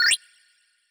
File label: sys Enter; WolfRPGEditor